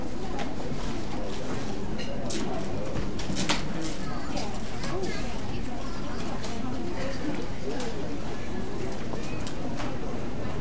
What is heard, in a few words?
store, ambiances